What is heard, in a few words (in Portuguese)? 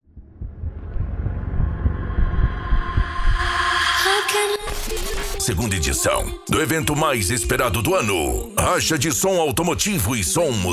racha de som automotivo e som musical